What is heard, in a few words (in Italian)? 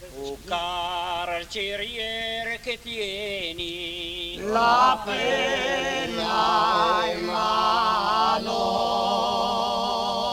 coro uomini; o carcerier che tieni la penna in mano (frammento)